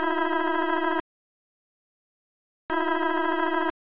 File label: incoming call